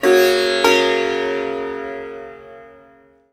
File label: SITAR CHORD; SITAR CHORDS